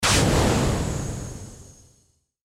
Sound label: Air Pressure Blast